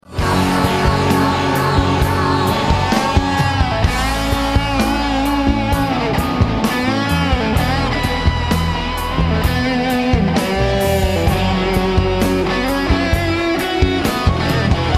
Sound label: Solo Sovtek Big Muff, Live Broadcast; Poland; Sovtek "Civil War" Big Muff; Live broadcast 2016